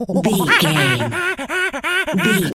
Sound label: monkey chatter angry